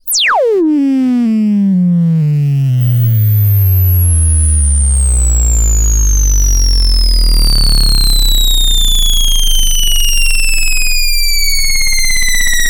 very long rise fall two tone wobble high low space future modular synth; fvtt sonniss gdc audio